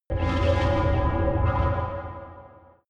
space alien machinery working

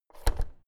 oven knob press out hard

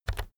keyboard external key press very fast soft

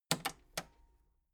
metal button cassette rewind slow

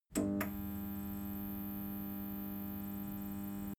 fluorescent lamp fast

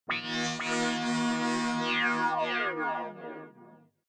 sci-fi rising small planet

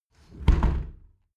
window close tilt regular sequence

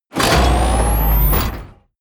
sci-fi plasma cannon startup

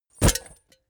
leather metal bodydrop

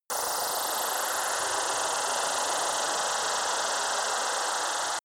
motoric machine

